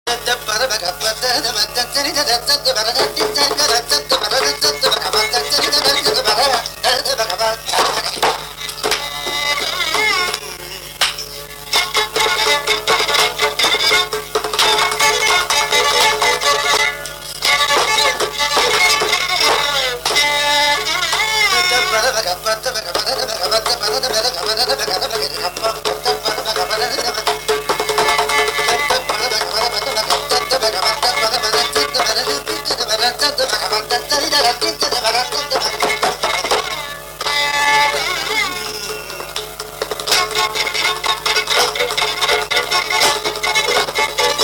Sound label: mohanam swaras misra gati